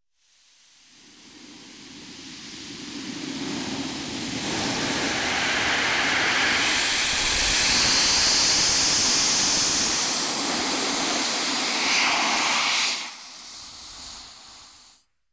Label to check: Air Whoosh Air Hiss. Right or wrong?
Right